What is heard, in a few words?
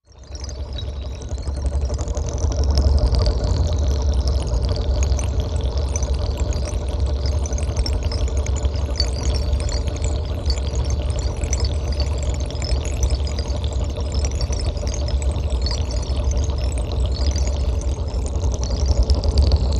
Bottle Sorter, sci-fi ambience